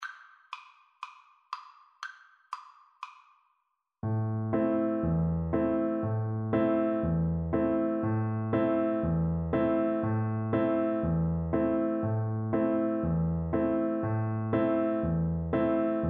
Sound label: odessa bulgarish viola